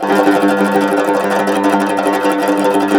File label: CUMBUS TR, Cumbus Tremolo